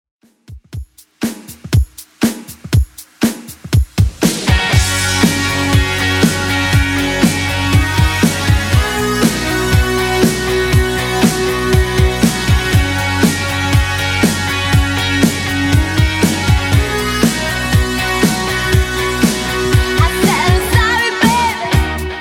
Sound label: 80s Redrum 120 bpm